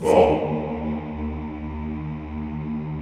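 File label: Vohmm Houuu, Chorus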